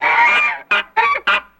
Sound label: Goose, African, Call